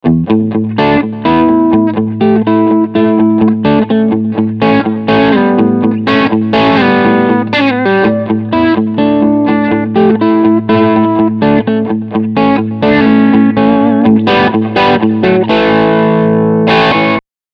Crunch Riff Steg P90

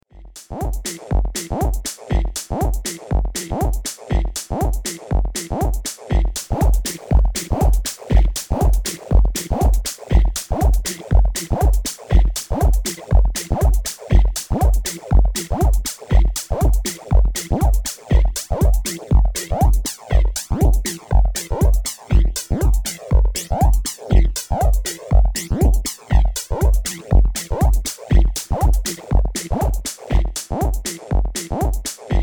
Reloop KUT Flanger

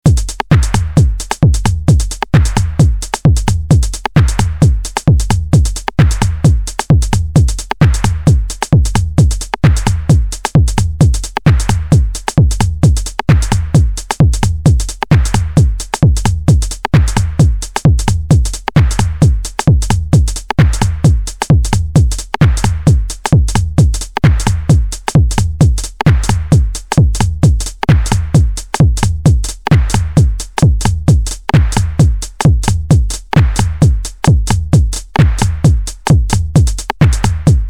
MFB Tanzmaus Shuffle

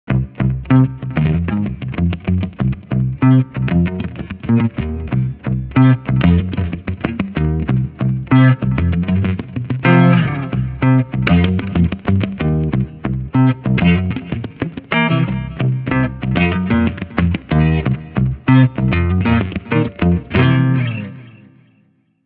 Soul Bass

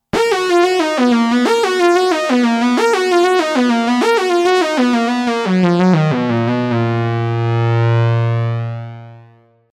Arp Quadra Lead

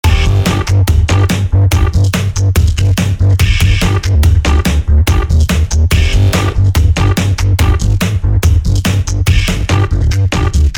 Warm Exiter Ozone4

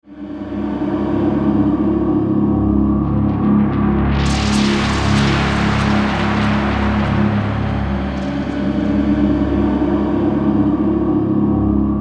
Firedrone Flamestriker

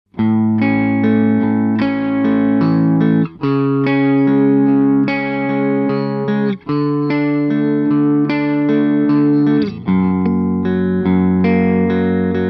Korg NuTekt TR-S Edge of Break up P-90; Favorite Setting

